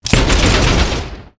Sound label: hammerturret firewindup player; gow